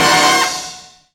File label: HIT Band, Big Band Hits; Club-50 - Foundations Roland; Hit Combo